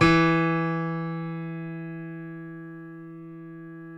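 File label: E2 piano; Northstar - New Gold VOL-2; BOS.GRAND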